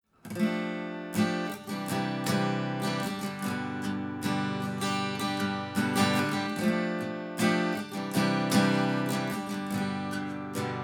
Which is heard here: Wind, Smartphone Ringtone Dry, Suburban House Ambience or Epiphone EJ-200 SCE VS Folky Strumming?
Epiphone EJ-200 SCE VS Folky Strumming